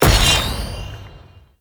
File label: mag wheel